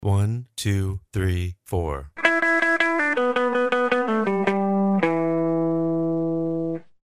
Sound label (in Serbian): Blues lick FAST, Gitara